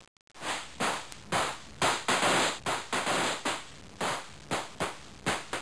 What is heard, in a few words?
Fire Crackers